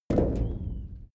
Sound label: step metal step; schritte